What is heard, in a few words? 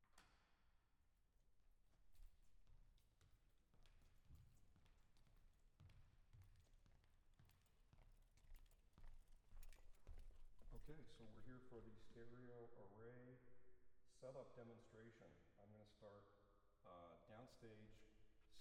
PNO; chamber96